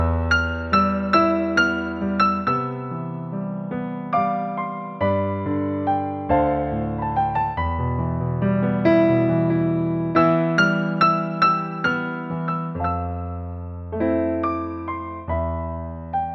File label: Piano